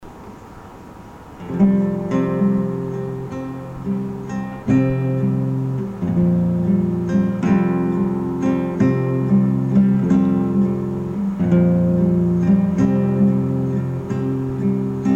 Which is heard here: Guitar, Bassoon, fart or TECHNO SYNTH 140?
Guitar